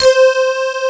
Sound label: TIGHT KB, keys; East West - Global Sounds; S.I KEYS